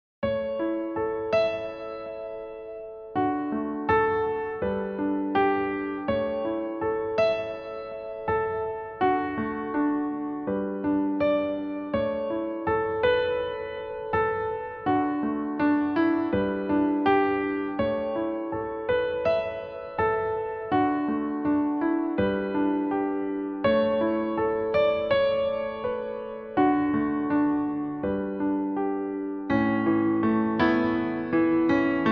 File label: Karaoke